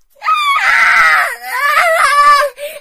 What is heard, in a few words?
fail sound; Osu!; HentaiKontrol